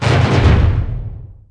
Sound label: dojo drum; Ninja Avenger Dragon Blade